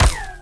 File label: rico repeater Cannon; impacts explos